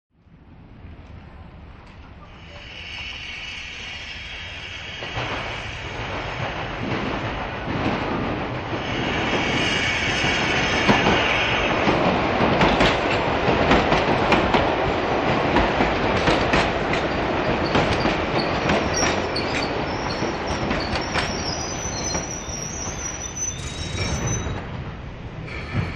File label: Train In Stop Away Underground Train Above Ground; Hollywood Edge 3-D SFx